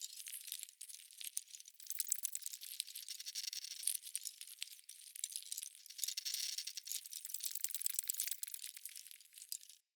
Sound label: Corrupted Critter Loop, Ambience